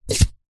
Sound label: food drink coffee cup takeaway insert single cup into stack